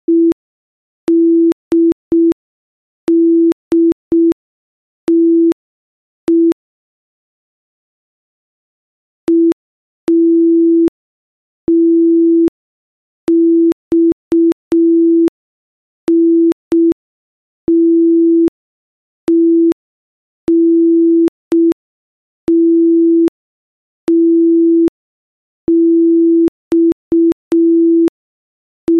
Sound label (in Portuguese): ritmo velocidade